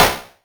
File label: Noise